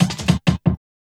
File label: loop end, 160 BPM; Ueberschall; Drum & Bass Resonance